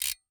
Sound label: Industry Metal Clink Gear Fast